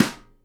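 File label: snare nylon damp, Live Snares; E-MU Producer Series Vol. 8 – Platinum Phatt (CD 1)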